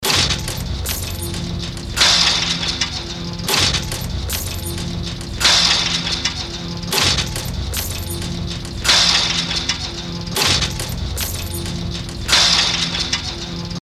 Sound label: musical fx loop